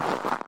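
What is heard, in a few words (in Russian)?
пробираясь по высоким сугробам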